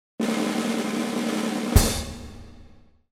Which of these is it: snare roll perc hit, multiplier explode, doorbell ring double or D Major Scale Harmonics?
snare roll perc hit